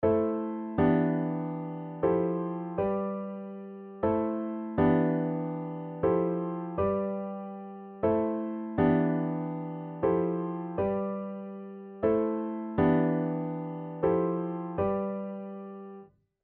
EZkeys 2 House

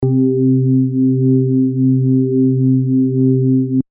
Classic Organ C4

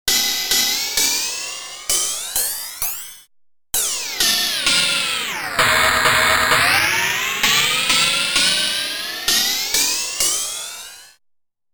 CY RIDE 909

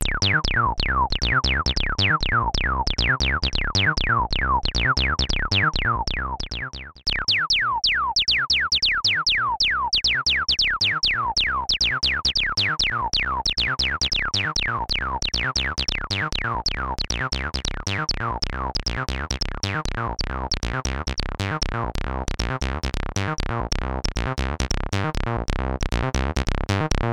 Bassline normal clean dirty

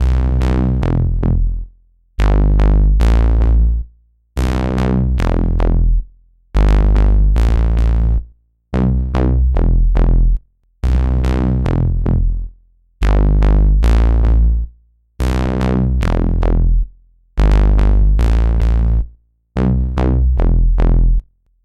Bass Classic

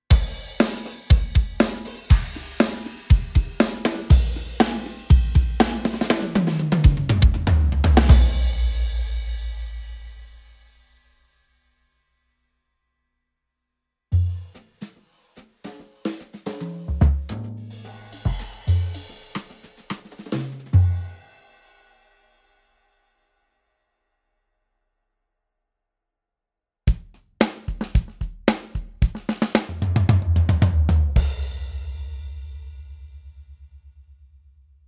Drums

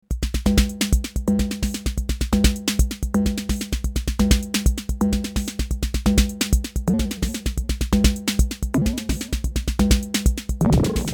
Micromoog TR-606

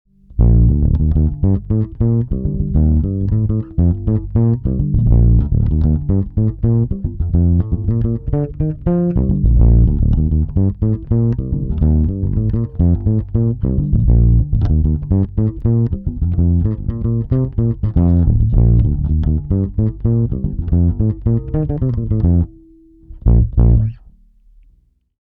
Sansamp neutral Char left

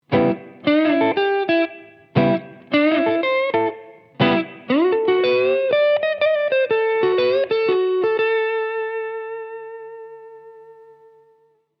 Blues Rhythm Lead

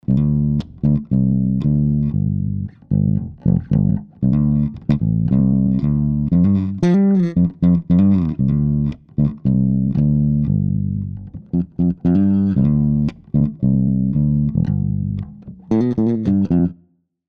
Bass Twin Tube

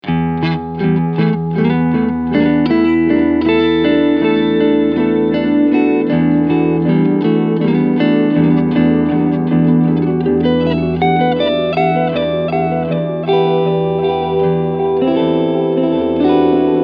Long Delay

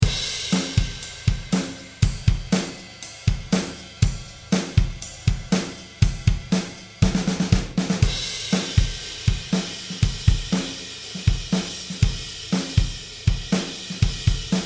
Drums EQP Bypass Mode

